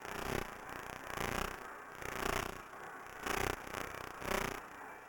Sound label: diss ability loop